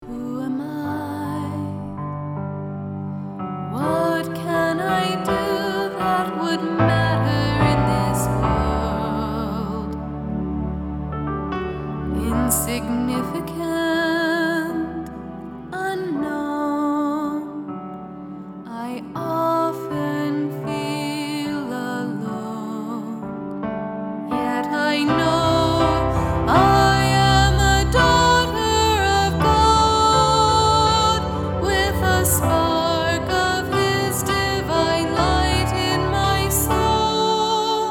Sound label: Original Vocal Piano